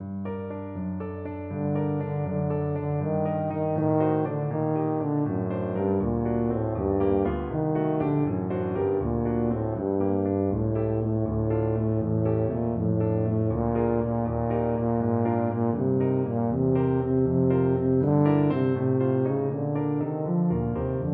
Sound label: scarlatti cessate, tuba